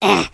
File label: pre attack; The Monster Plane; kolmods preatt